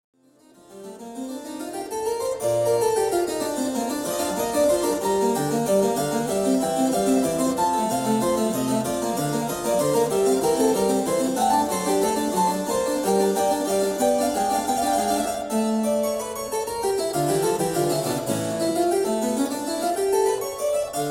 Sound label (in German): Praeludium H dur; Bach